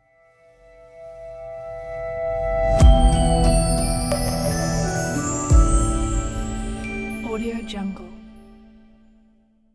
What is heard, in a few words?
gentle soft logo